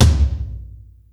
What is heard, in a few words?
tom rock low, Yamaha Motif ES-Series; 300 Drum Machines